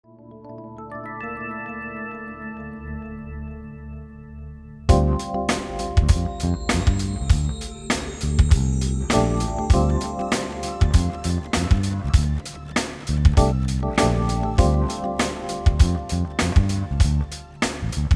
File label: Night Groove